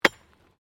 Digging Pick Concrete; Construction; Various digging tools and processes

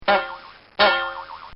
Spring Bong; Funny Musical; recorded in a custom built sound booth; Bobby Cole

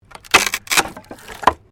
WOOD PLANK SPLIT

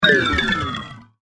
Soft Laser Impact; Laser Sound Sound Effects; science fiction sound effect; star wars / trek style games

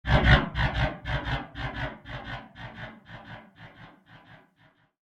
Intense Laser Decay, laser gun sound effects

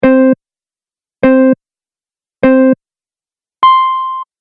Race Countdown Beeps; Beep Sound Effects

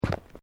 Footsteps Boots Road Step; Footstep Foley; Footsteps (Sound Effects:Human)

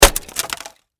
Impact Axe Chop Log; Axe Sound Effects; Axes (Sound Effects:Tools); SFX Bible 6

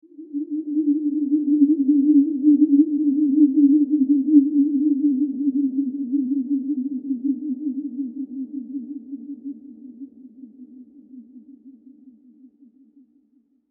Haunted Passing Spirit; Ghost Sound Effects; horror; Andrew Fleming

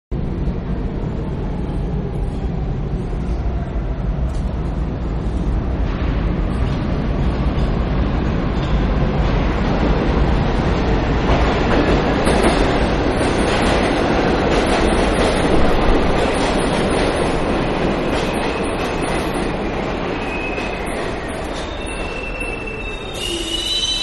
Subway-Riding On Board, Interior; Vehicles; Subways; Manhattan Production Music

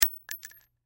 Bullet Shell; Bullet Shell 223 Drop Cement; Drops (Sound Effects:Objects); Blastwave; Warfare

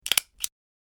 Stapler - Press And Release; found sounds

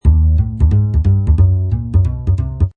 Jazz walking bass loop in D# minor at 180 bpm.; Bass Loops

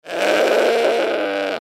Goat Bleat Bah; Farms; Backgrounds; Farm Sound Effects; Animal

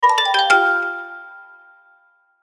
Logo Xylophone Reverb; Delay; Descend; Blastwave; Sonic Logos

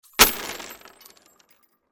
Nails Drop Fall Metal Small Debris; Hardware; INDUSTRY

